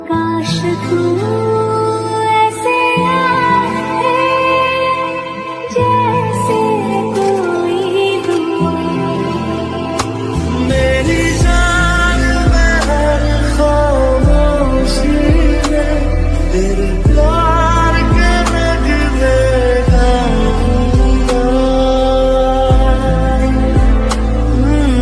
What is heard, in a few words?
Female Ringtone